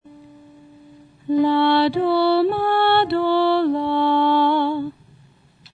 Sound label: diminished triad la based